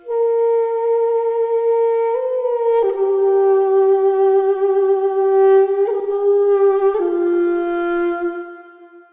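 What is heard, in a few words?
F MIN DUDUK, Organic House / Deep House Sample Pack Oriental & Middle Eastern; Azura – Organic House Sample Pack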